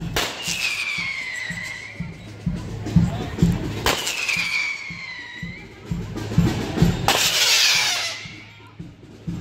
firework whistle 3 times

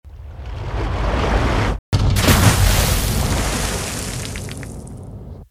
ship miss

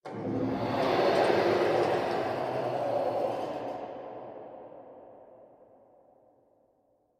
alert

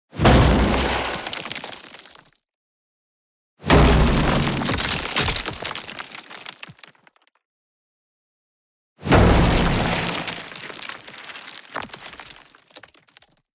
wall break

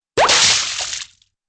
bubble pops and splashes

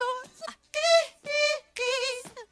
heeee heee heee

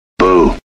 slap boo; slap happy lion; mouse

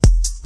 slice

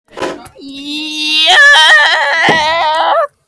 yaaahhhh

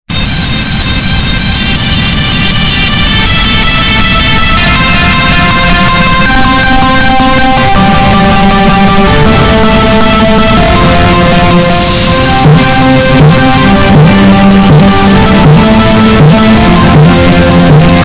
very loud bass boosted

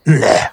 naaahh

